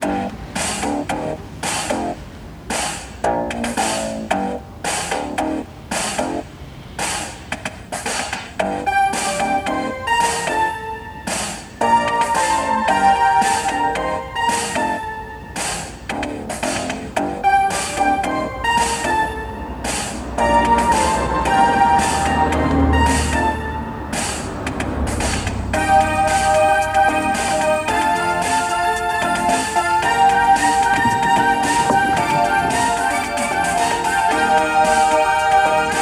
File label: Laptop Music; sons of the forest